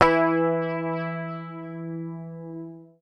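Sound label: bass, E3, right channel; PICK; Sampleheads; Will Lee Bass Library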